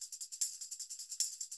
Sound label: TAMB, Percussionworks